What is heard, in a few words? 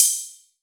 dubstep club hat